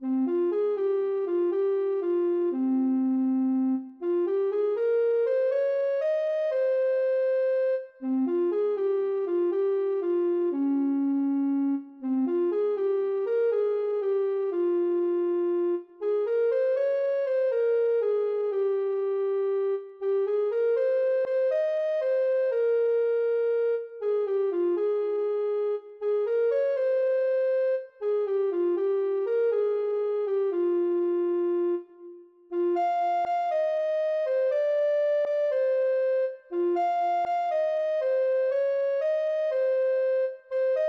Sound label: ocarina